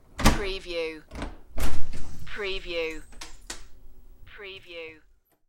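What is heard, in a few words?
Open Front Door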